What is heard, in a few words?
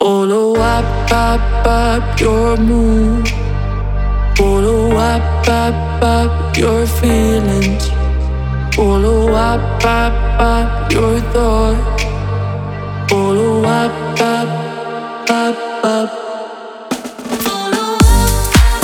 Deep House